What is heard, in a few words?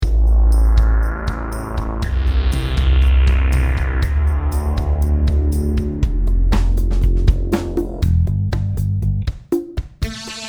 bass